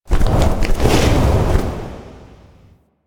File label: Owl Attack End; Duviri; Animals